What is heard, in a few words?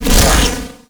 Corpus Arachnoid Camper Shield Launch; Warframe